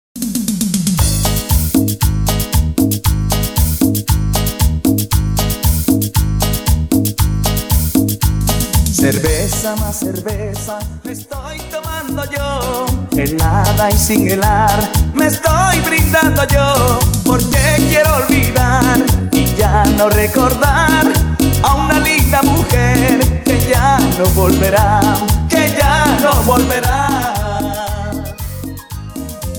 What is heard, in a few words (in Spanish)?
Intro Acapella 116 Bpm